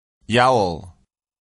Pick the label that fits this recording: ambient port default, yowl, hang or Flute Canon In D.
yowl